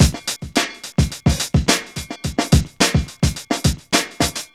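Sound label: 107 bpm, Funky loops; Zero-G; Funky Elements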